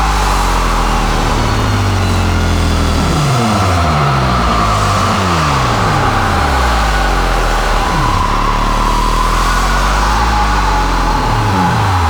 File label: Operator Beam Loop